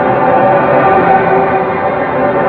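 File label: electric on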